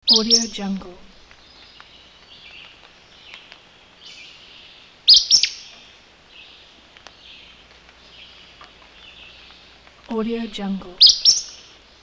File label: arcadian flycatcher; sound ideas